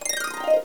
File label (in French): harpe, harp